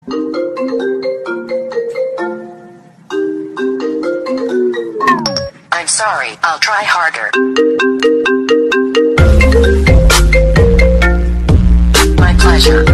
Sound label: iPhone 7, Klingelton